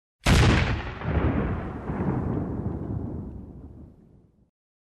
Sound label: Cannon Blast